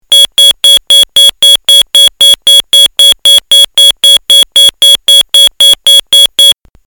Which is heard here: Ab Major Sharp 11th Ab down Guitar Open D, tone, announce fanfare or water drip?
tone